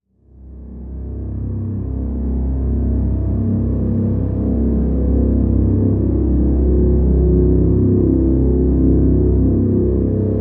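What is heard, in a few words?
Drn Dormant C, drone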